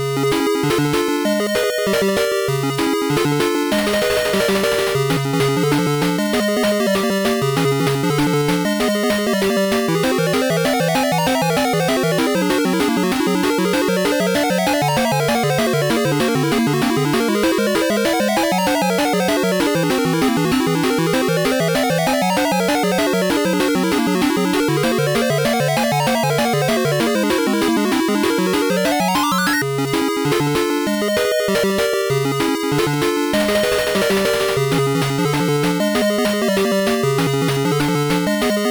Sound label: Boss Music